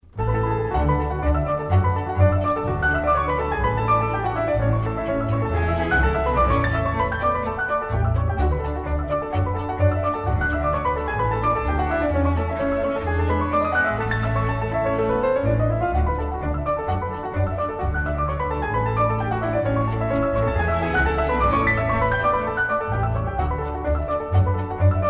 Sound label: piano quintet a major; schubert